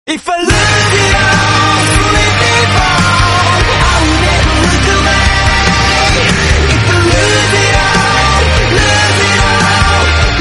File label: english song